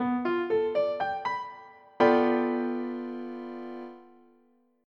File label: G9